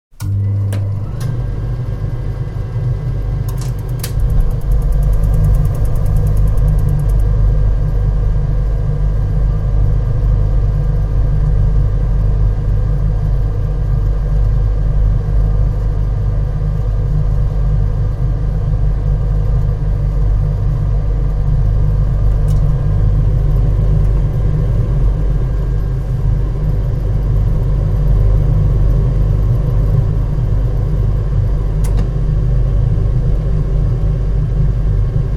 Gas Boiler, Gas central heating Combination boiler firing up; Gas central heating Combination boiler firing up and then after running for a while, shutting down.; Furnace